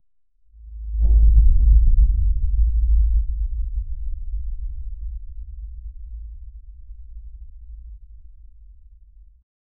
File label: deep primordial silence